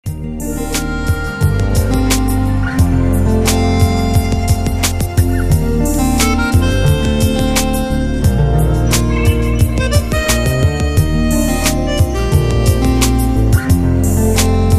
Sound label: french lounge